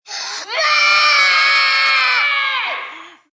Group Yell, Scream, Group, 2-3 young children, monstrous yell, short, kids; Screaming; males, females, girls, boys; (human voice)